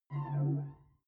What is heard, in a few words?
sci-fi visor clean; krieg-waffen; soundpool